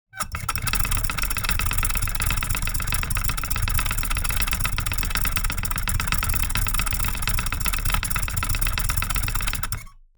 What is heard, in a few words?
Bench grinder rattling; Industrial Machinery; gfxsounds